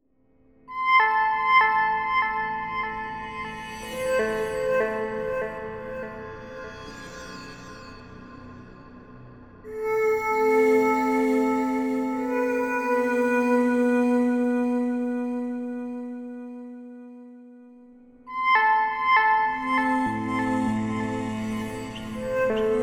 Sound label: Conversation Underscore, Dark Noir